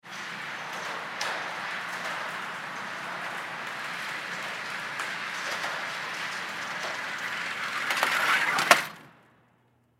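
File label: Shooting Range Interior, Shooting Range Rail Slide Back 10 Ft; Miscellaneous Sport; Sports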